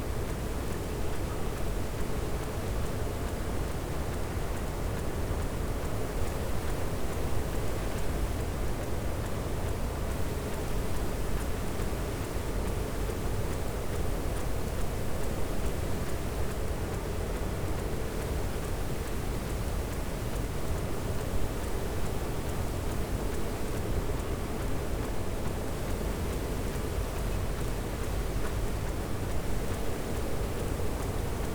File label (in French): cachalot negatif, Physeter Macrocephalus